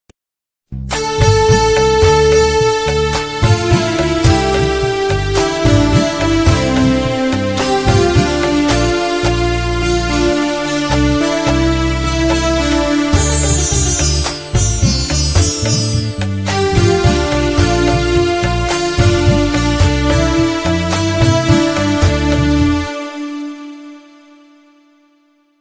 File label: instrumental ringtone; gadar 2